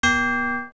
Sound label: Song Error; Mess up an ocarina song; zelda; OOT